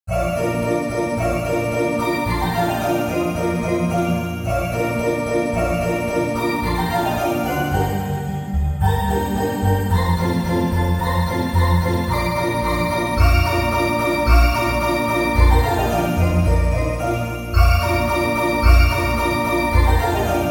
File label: Turkish March; Beethoven